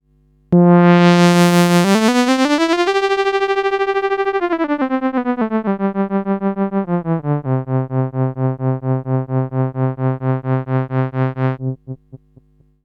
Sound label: WHEELPOLY Model 15